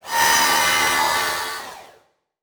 Multimedia Internet CD-Rom Flash Animation

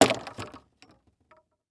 Sports Club Golf Club Dropping Into Golf Bag

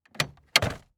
Truck Ford Ranger Open Glove Box Interior; transportation

